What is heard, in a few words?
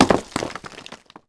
Hit Rock; Civ4 beyond sword